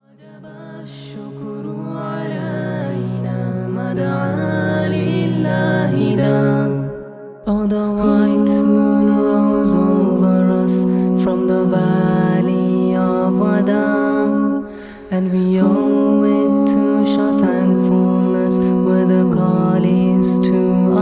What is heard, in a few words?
Karaoke Version